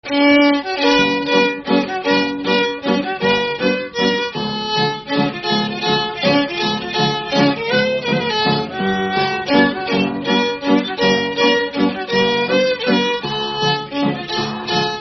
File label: Waltz; Gross Friederike Waltz